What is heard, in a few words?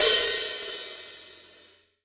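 open hi hat, Real Drum Kit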